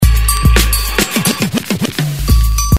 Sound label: Scratch